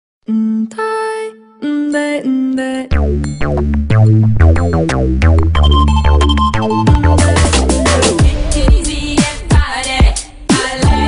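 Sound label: New Airtel Ring Tone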